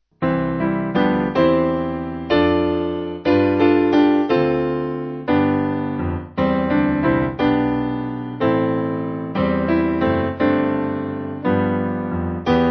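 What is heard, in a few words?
Piano, church music backing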